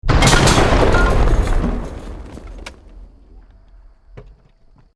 BUILDING COLLAPSE SCAFFOLDING SMALL; Created dramatic effects of buildings collapsing or breaking; Construction

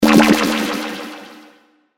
Ray Beam Burst, Science Fiction; 5.1

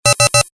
Computer Beep, Short; Multimedia; Stuart Duffield

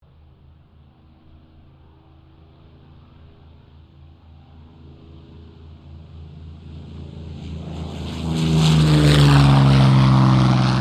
AIRCRAFT P-40F KITTYHAWK TAKEOFF; P-40F Kittyhawk / Warhawk/Tomahawk; 1942; Airplane Sound Effects; Airplanes (Sound Effects:Vehicles)

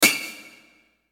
chime alert, Chime Alert Bright Percussion Hit; Production Element Imaging Element Accent Transition; Multimedia; Chimes; Blastwave

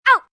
Pain Kid Short Ow, Pain, child, boy, short

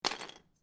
Lego, Single Lego Piece Drop Onto Pile Of Pieces; Miscellaneous Toy; Miscellaneous Toy Sound Effects

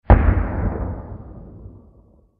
War Sounds Explosions, Bomb Sound Effects

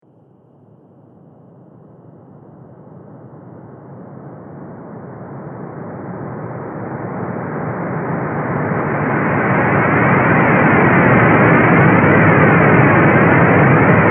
Galaxy Whoosh Strong; sound design

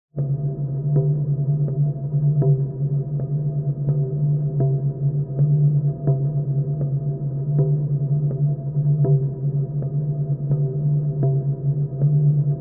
Drone Musical; Drones; Sound Design

